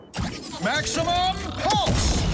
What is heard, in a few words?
maximum pulse; games